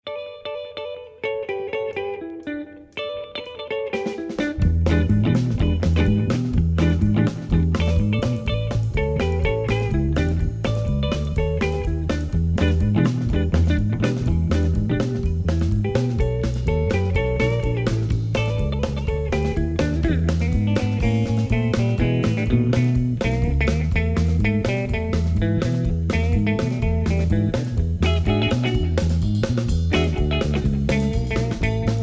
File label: Muddy Water Mojo Blues Drumming